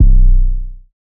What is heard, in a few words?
808 B; Cymatics; Oracle Sample Pack; 808s